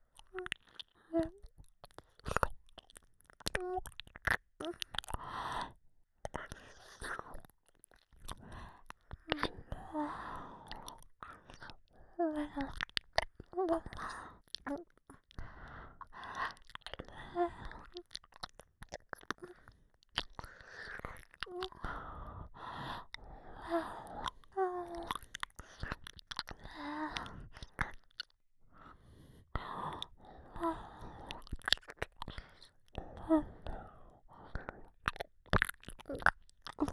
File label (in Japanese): れりゅう…れちゅっ…, 左耳, ASMR